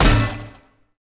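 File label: impact sword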